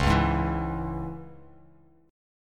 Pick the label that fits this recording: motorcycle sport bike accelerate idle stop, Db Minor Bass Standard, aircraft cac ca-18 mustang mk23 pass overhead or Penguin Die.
Db Minor Bass Standard